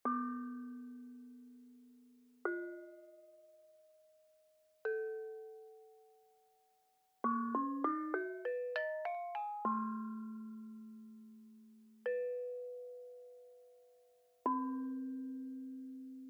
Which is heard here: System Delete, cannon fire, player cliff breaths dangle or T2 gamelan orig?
T2 gamelan orig